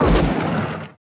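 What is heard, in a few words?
laser gun; Total Control - Action Cutz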